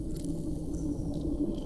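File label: Music Dpad Evaporate 145; from dust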